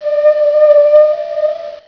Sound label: WHALE; Canon CameraWindow